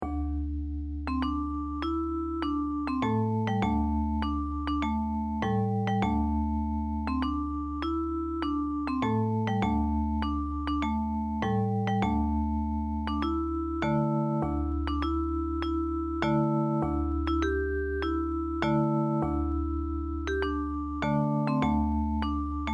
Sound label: japanese folk song; kisarazu jinku